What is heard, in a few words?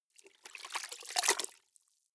clothes water updown soft, haushalt; haus garten